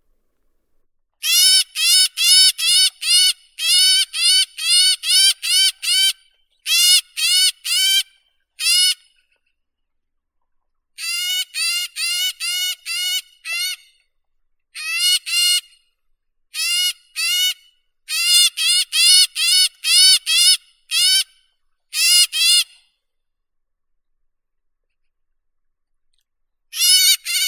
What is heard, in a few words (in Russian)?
zvuki prirodi sorokoput serii lanius excubitor